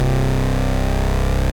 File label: Brown Sound Bass, Wavetable; Instruments; Ableton; Synth Essentials